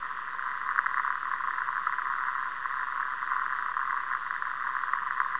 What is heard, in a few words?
radio background